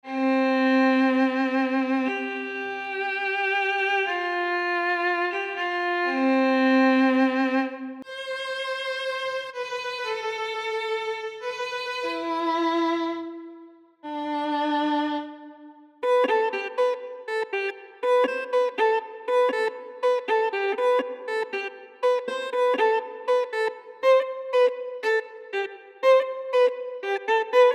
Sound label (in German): Violine solo; Orchester Sampling-Libraries